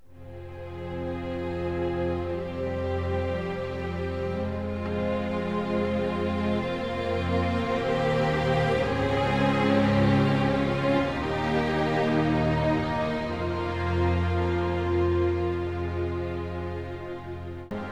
strings, Sad

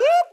"woo", voice; ooh ahh

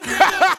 "ha ha", Voice

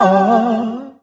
"uh ah" SUNG

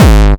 industrial, Kicks Electro

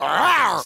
growl

